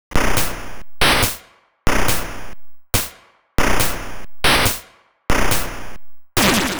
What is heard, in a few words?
140 FX Bm, 140 BPM; CHIPSHOP DELUXE; CHIPSHOP LOOPS